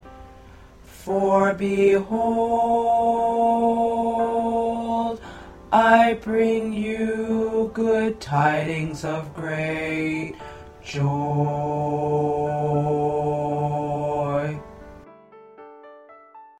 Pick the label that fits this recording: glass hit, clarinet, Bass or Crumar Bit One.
Bass